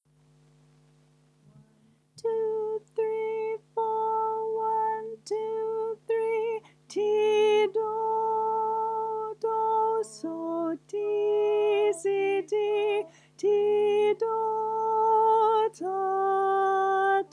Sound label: high solfa chorus; Auld Lang Syne; Folk Choir music